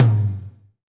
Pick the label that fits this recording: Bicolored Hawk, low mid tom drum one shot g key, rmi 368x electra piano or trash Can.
low mid tom drum one shot g key